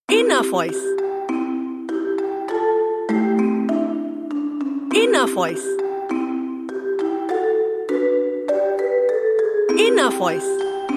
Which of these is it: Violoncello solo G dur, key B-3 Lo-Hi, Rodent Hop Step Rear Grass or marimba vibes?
marimba vibes